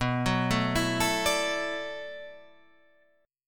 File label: B Minor 11th B down Guitar Standard; Bm11 arpeggiated; JGuitar